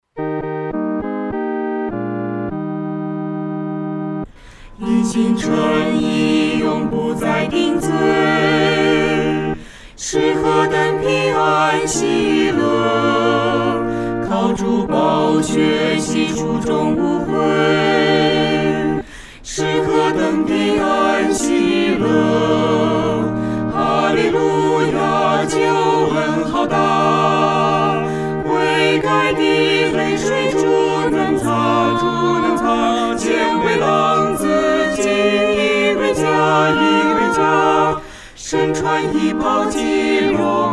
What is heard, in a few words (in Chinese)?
合唱 四声部